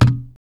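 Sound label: PERCUSSION; E-Lab; X Static Goldmine 1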